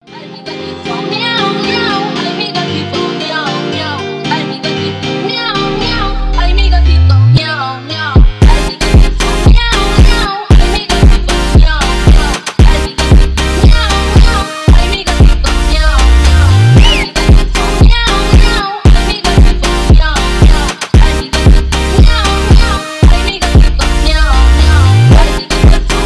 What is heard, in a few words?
miau miau funk